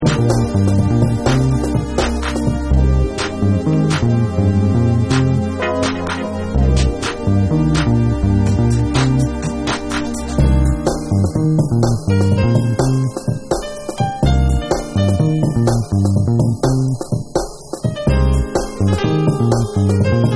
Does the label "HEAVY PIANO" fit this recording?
Yes